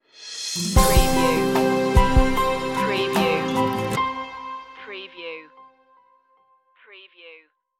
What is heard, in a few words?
aquatic world stinger